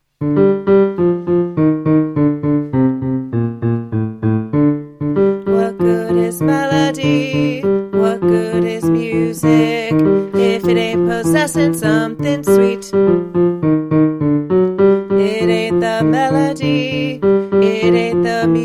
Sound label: tenor